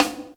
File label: PERC; ZERO-G; Datafile